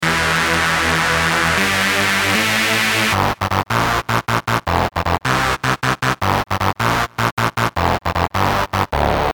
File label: overdub test